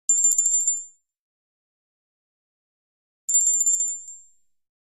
Tinkle Bells Small Bells

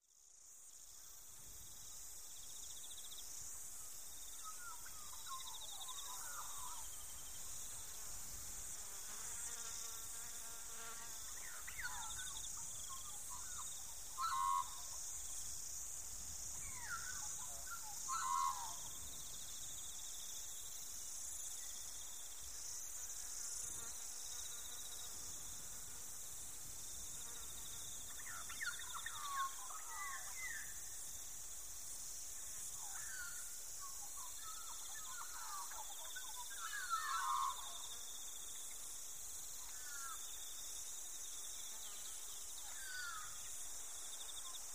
New Zealand Rainfore, rainforest

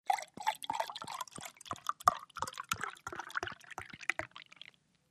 Beer Bottle Pour Glug; eating & drinking

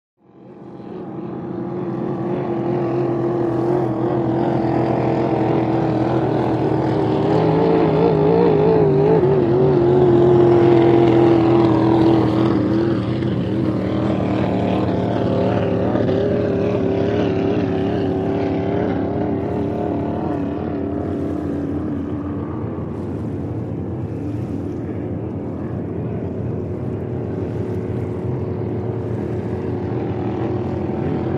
Hydroplane By Light

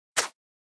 Button Whoosh Sci F

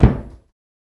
Tub Hammer Hit Dampe; hammers

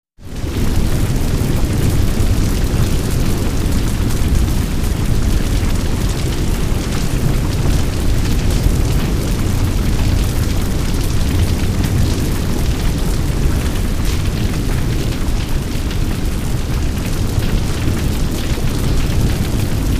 Chemical Fire Roar L; medium

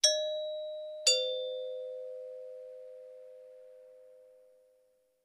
Door Bells Avon Style

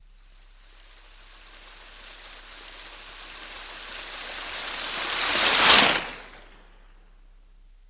Water By Doppler

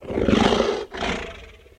Dinosaur Growl Soft

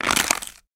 Bone Crab Shell Break

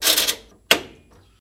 Washer Knob Turn, washing machine

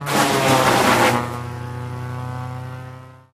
Mulcher Rips Wood, mulcher

